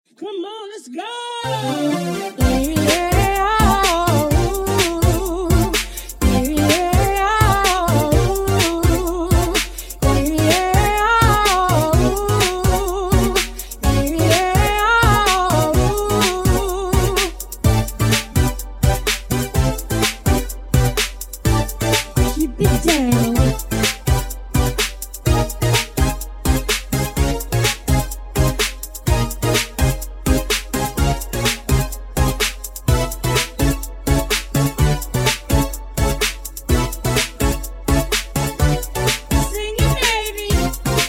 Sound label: disco vowels